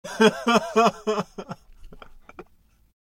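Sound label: carefree cheerful laughter of young man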